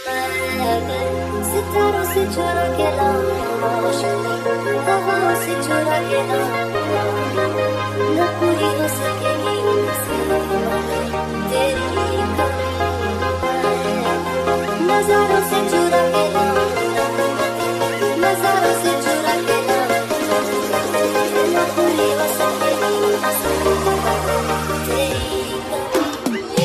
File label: ringtone music ringtone, bollywood ringtones